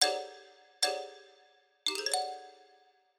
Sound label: Hush, Lullay, excerpt